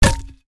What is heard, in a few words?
Bat Wall Shut; Game rip; MiSide